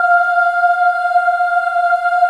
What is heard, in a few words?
F4 child ah, choir; USB Soundscan vol.28